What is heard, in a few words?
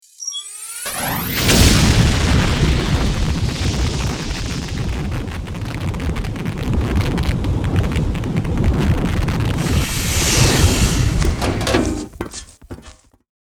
Jump Pack; Warhammer 40000; Tabletop; munitorum Volume I Sound Effects